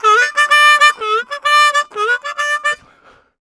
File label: Harm 129bpm G, Harmonica